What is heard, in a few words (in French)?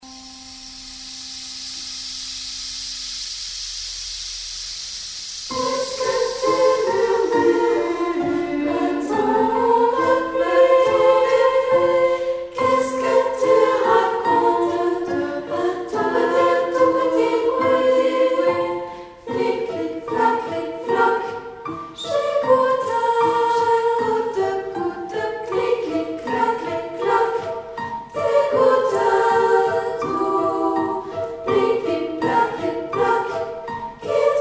baton de pluie